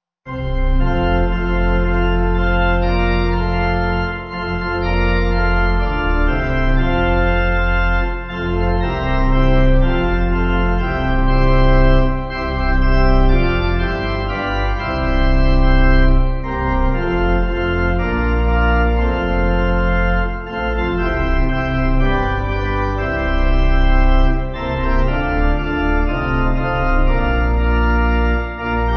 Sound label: Pipe